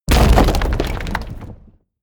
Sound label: break stone medium